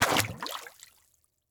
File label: Liquid Water Water Plastic Cup Shoved In Water